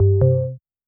msg In

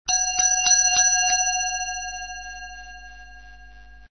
bell

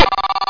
arcade

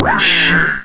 machine

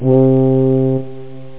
trumpet

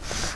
slide; Cool Address 1.5

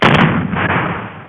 blow; Piposh

